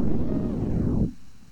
Tiger Growling, MOS6581R4; SoundEffects

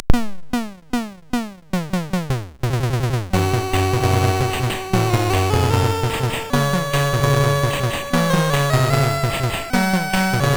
Death March sid MOS6581R2

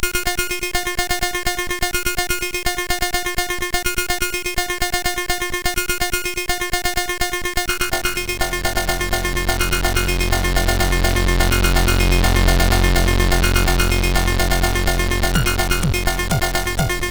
Thunder, CSG8580R5; hvsc

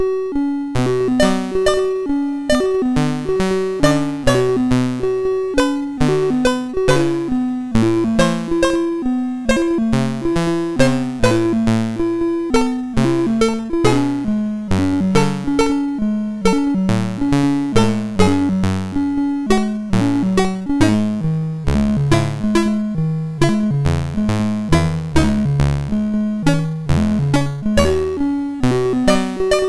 Randomly generated music BASIC, sid MOS6581R2

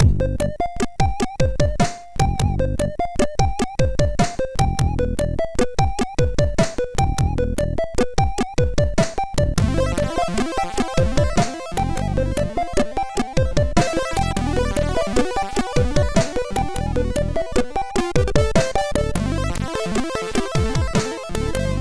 Boogie Funk, MOS6581R4

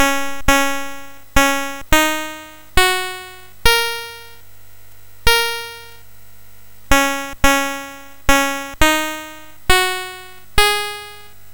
Tom Dooley BASIC sid MOS6581R3

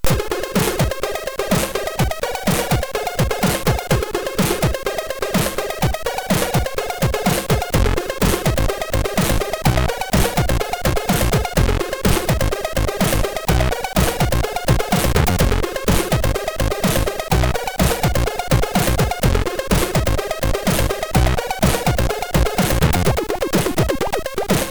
House, sid MOS6581R3; hvsc

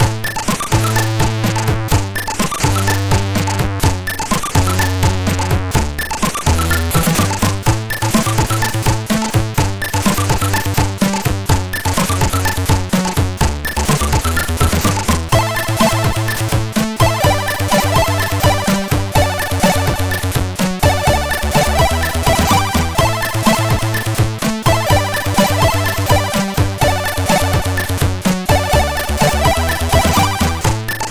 sid, MOS6581R3

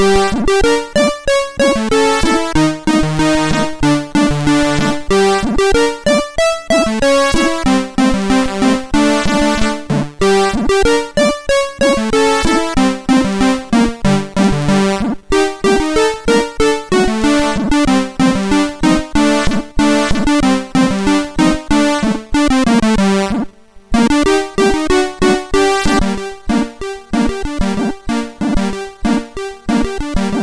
sid, MOS6581R2; Secret of Monkey Island

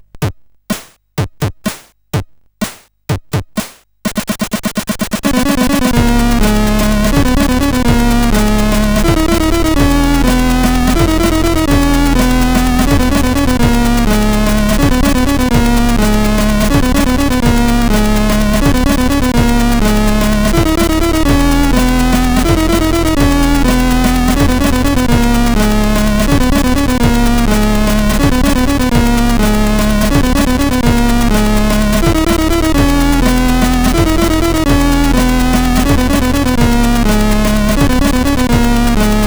Heavy Metal Suxxx, CSG8580R5

